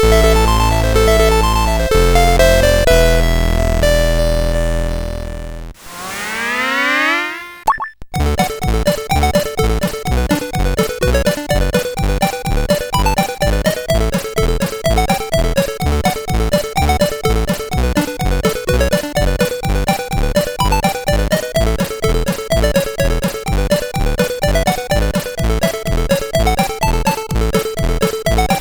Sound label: tune, CSG8580R5